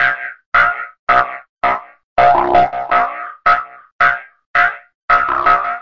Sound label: 165 grooving crunchy jawharp; WORLD LOOPS